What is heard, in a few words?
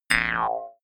mouth harp